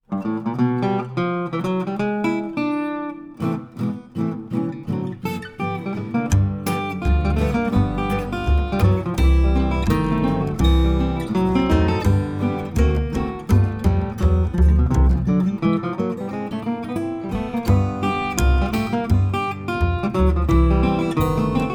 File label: PICKING THE GUITAR